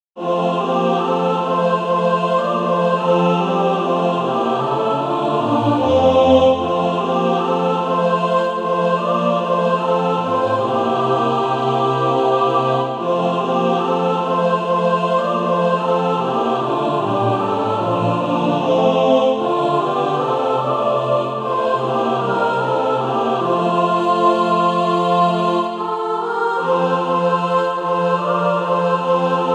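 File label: mixed, Practise Music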